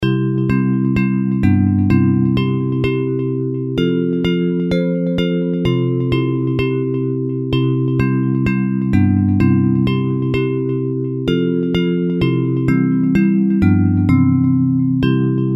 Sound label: Bells